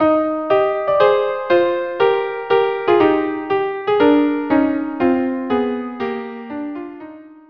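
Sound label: violin violin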